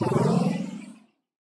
wave teleport

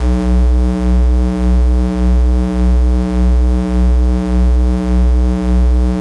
hologram; The Swapper

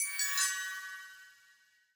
medal get bronze; CrossCode

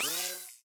shock short

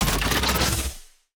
panda open gun; battle

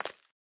land concrete; The Swapper

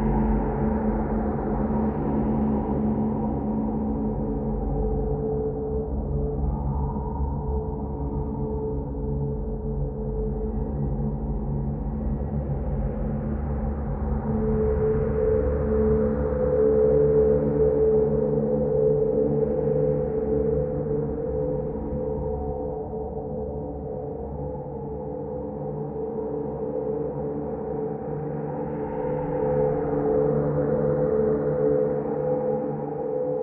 alien tech; The Swapper

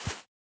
snow step

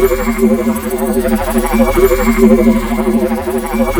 wave loop

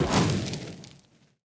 ferro heat hit

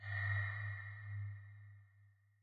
gui hover; The Swapper

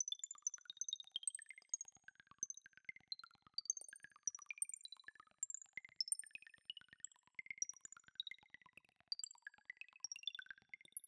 droplets; CrossCode; background

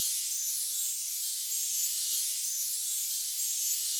medal shine loop; CrossCode

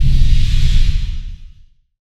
jump scare small; halloween

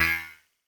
bot failure, puzzle

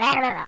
mumble; World Of Goo

